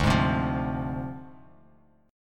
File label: D Minor 7th Flat 5th Bass Standard; Dm7b5; strummed